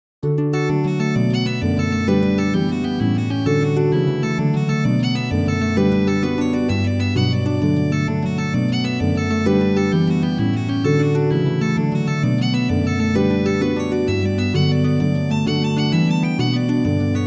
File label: Jig